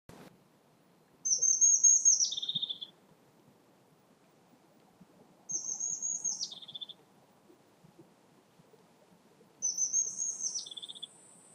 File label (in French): mesange huppee; Chant tchatché tambouriné : {AxT} (tsi)*.(te)*; Cri : quasi-identique au chant